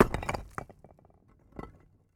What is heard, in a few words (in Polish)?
rzucanei kamieni